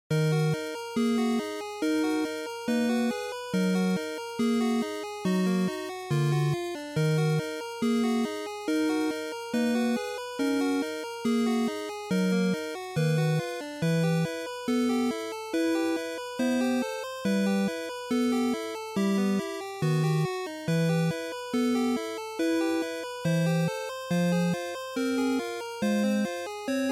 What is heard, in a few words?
NES RPG Dungeon